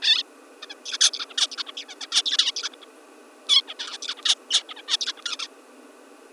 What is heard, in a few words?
bird pre ttx